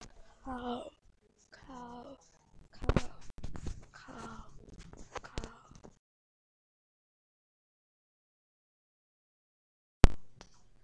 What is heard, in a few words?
cow